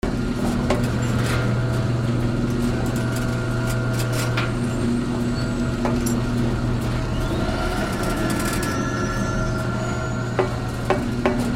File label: atmo steampunk machinery running